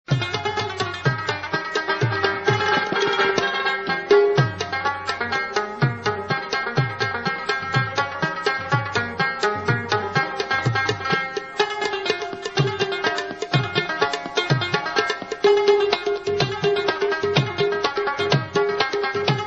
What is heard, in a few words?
Rabab Saaz